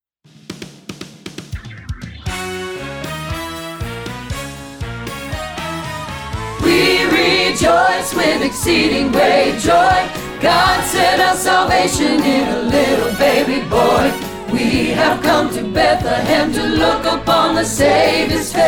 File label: reprise alto rehearsal track